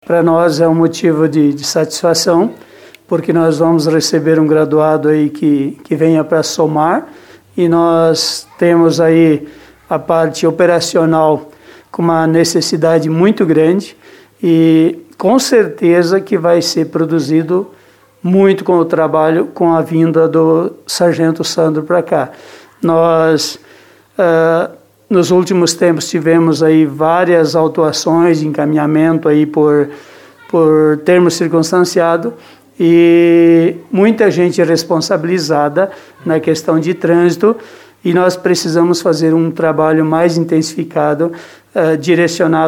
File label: wurlitzer